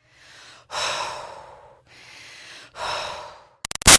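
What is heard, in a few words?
Human Female Breaths Exercise Cool Down; Breathing; BigRoomSound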